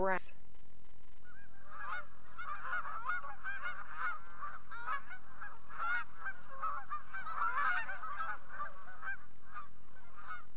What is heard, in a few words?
Canada Goose, calls